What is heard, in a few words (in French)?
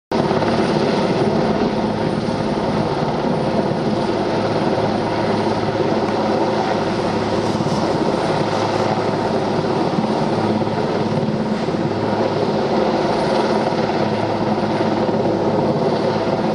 helicoptere en vol stationnaire